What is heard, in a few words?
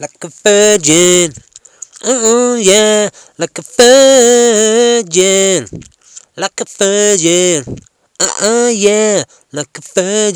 ringtone